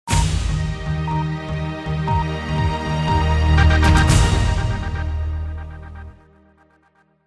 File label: BBC News 24 Closing Sting